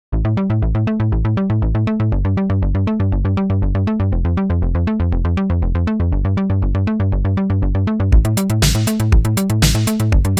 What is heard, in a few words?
Caveman Groove; Archion Defense